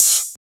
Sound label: Open Hat; FLPRO